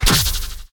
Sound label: scifi effect